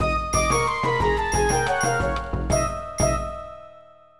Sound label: Failure; tokimeki memorial forever with you; saturn